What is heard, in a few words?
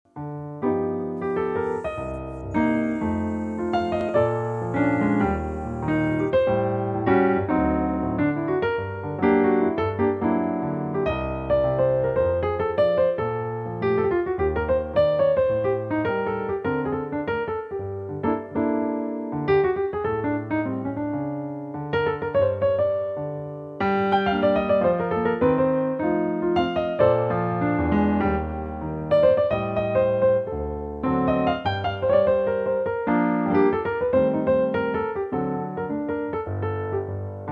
piano solo live